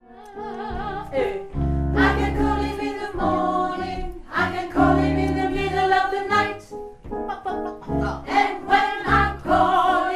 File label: mezzo; Partie 2; gospel